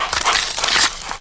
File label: saw gore